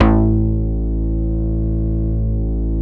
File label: BAS A2, 2 Step; USB Soundscan vol.51; House Side Of 2 Step; AKAI; BASS